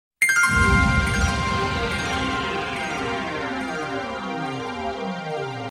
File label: Chimes Sms